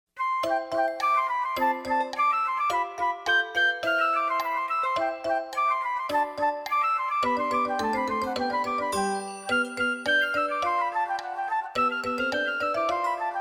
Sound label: Allegro for a Flute Clock; Baby Einstein Music